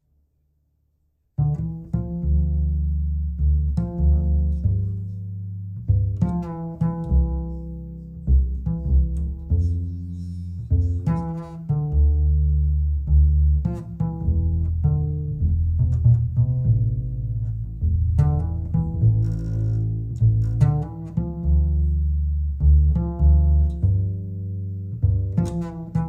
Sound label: bass